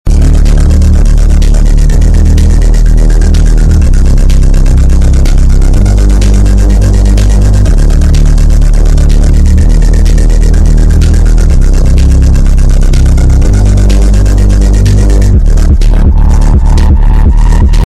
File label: bass boost